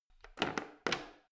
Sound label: plastic button creak defect press